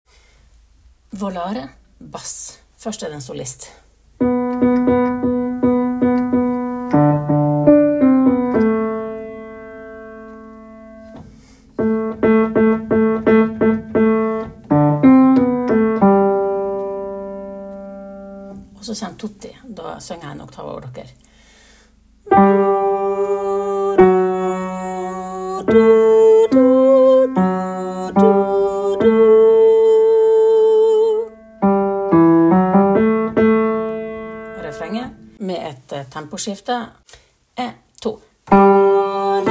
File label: BASS